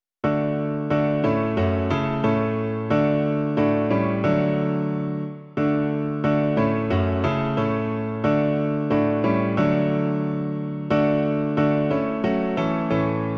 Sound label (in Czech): klavír; Stavení překrásné; Klavírní verze